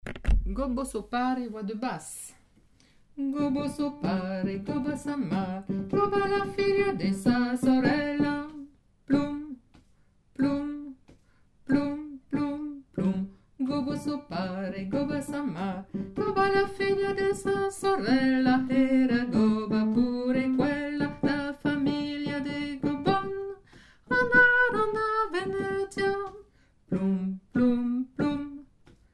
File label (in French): basse